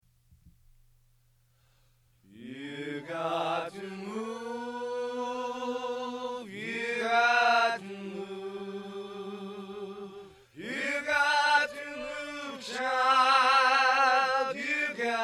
You got to move Bass & Tenor; training recordings

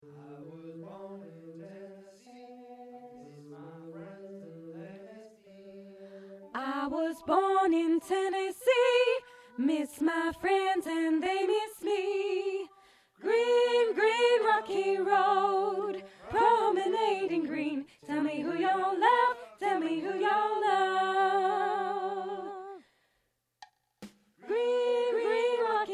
Soprano; training recordings

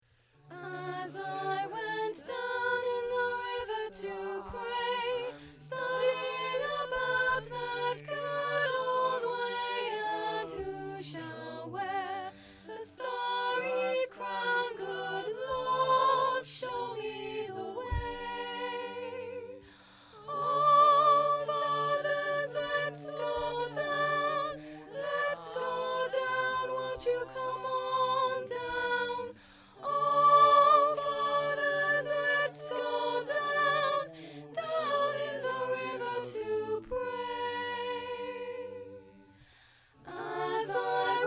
As I went down in the river to pray Soprano; training recordings

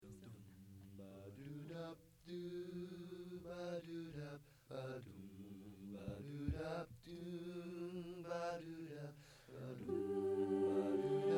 Spin Soprano; training recordings

